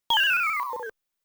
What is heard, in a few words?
Fall Sound; Base Field; Motos